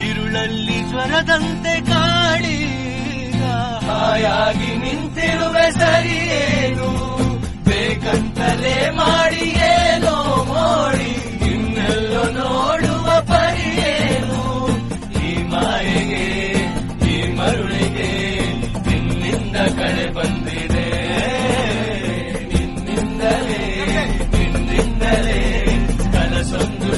friendship song

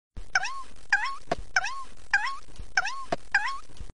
toing sms tone

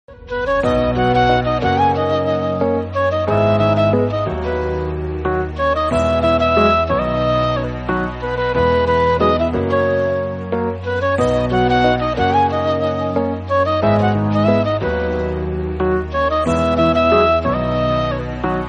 flute background music

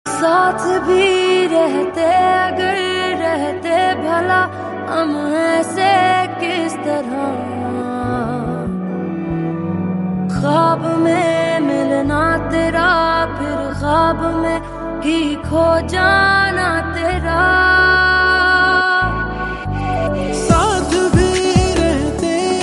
romantic hindi

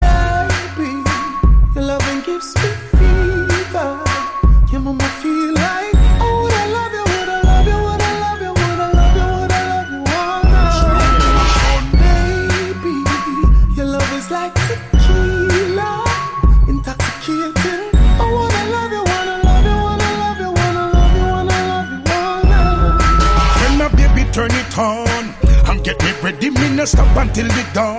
slow motion english

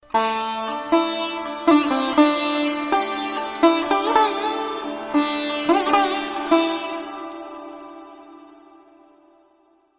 sitar

